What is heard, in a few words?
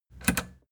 plastic button latch click enable fast